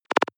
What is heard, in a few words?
noise clicks splutter low; maschinen; signale; soundpool